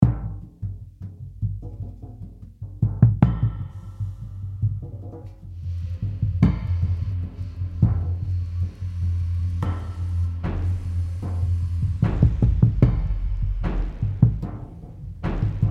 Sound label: Drums Metal Harp Hits; Drums Metal Harp Hits Block 2